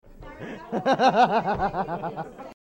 laugh

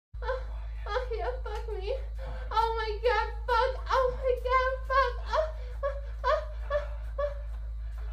sus noises

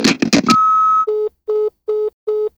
telephone hang up

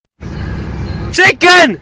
chicken

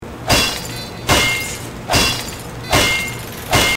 wrench long

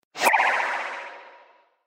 error; plastic memories

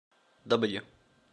w key

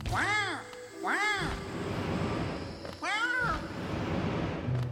wah

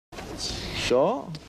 coo

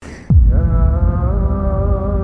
arabic secret music

